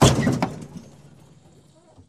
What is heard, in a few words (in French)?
Coups de pare chocs; Accidents voitrues